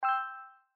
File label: Hand Total update ding; Blackjack